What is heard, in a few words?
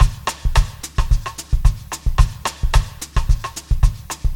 Latin Tribal Beat